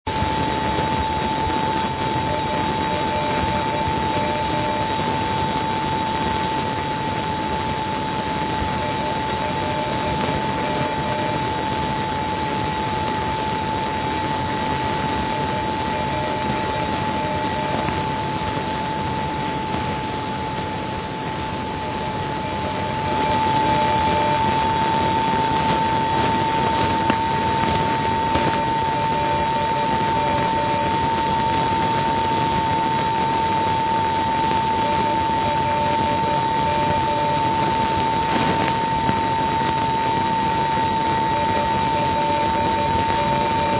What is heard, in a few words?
ILM NDB